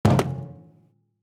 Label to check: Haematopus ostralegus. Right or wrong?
Wrong